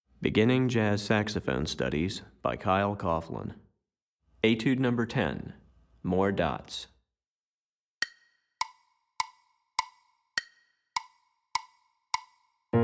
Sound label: Piano Part, Beginning Jazz Saxophone Studies Alto